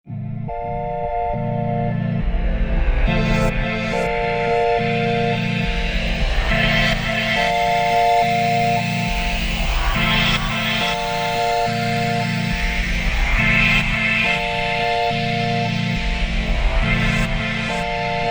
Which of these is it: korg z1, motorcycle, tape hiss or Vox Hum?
korg z1